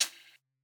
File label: closed hh; eddyflux